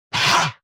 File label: Hiss; Barotraumatic; Shrimpbrut